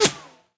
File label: miss